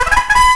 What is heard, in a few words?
BUGLE